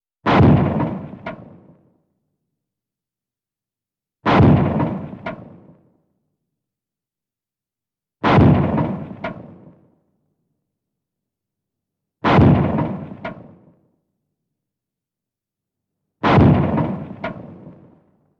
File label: Canon Shot